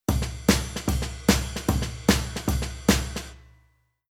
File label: Rhythm Swing Jazz